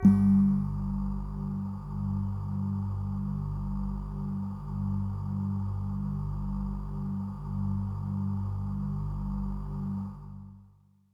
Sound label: Bell Air Kawaii G-1